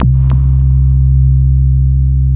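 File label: wall moog, bass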